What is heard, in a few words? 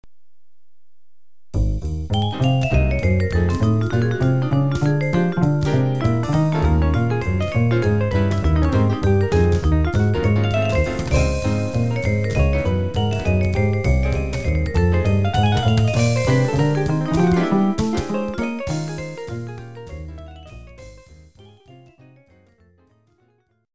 jazz